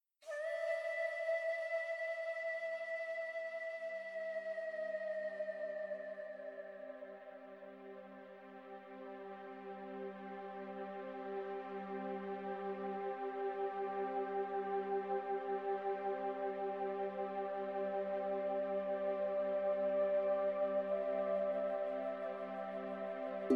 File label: ambient; PhoenixCraft 1.16.5